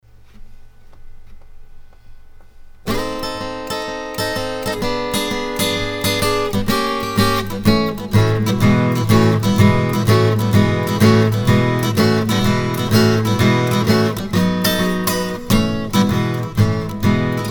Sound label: blues